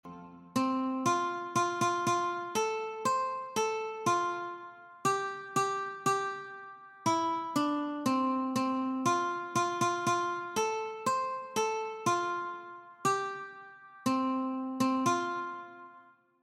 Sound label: The wheels on the bus Ukulele